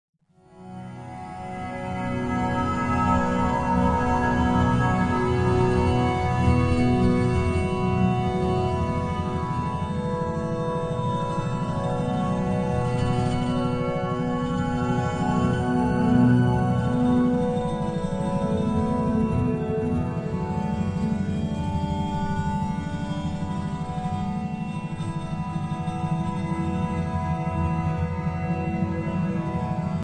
Alpha Meditation Isochronic Tones Brainwave Entrainment